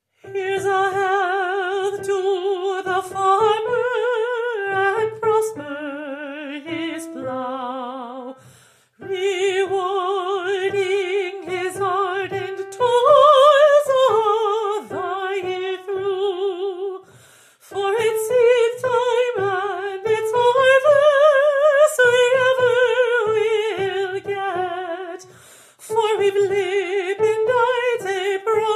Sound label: Sae will we yet 3rd verse with vocals